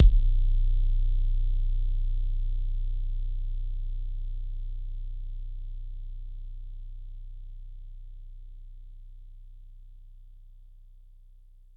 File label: kicks, Bass Drum